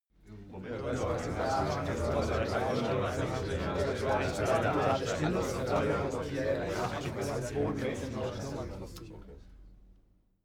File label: male crowd chatting calmly short